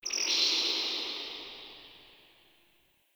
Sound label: Forest Distant Bird; Warframe; Grineer Forest